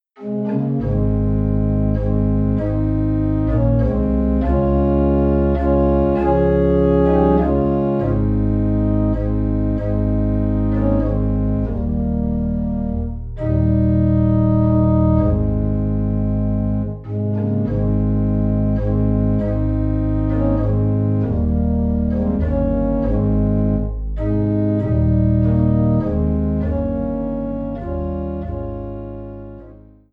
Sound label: Organ Low in A Flat; Just As I Am